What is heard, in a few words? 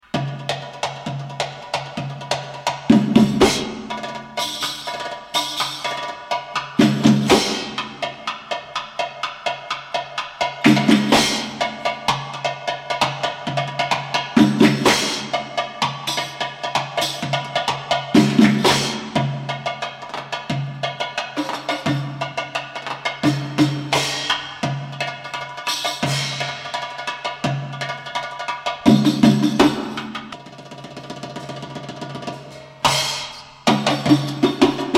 drum solo